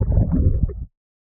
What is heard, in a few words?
underwater bubbles; soundscrate